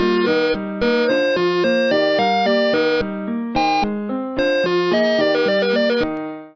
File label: Jingle, Music; Weasel